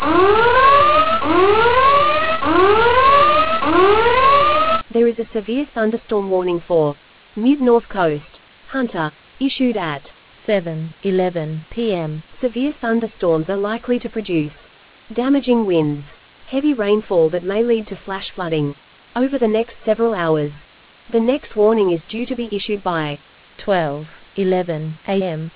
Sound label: storm audio